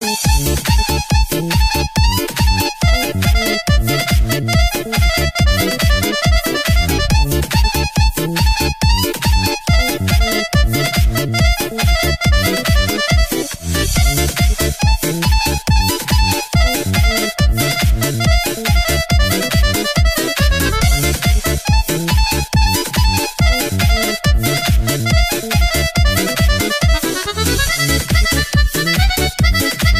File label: balkan kolo